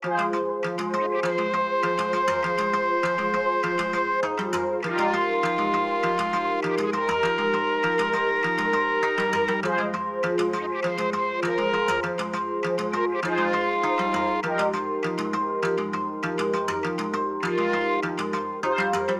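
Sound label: Melody 100bpm F minor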